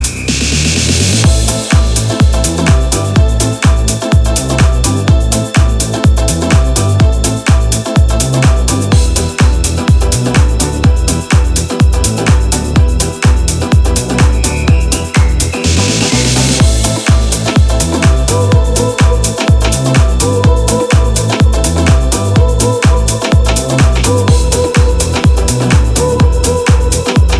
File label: Latin House Dub Mix